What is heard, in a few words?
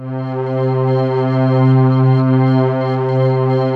JV-1080 WARM SHIM; VINTAGE-EXP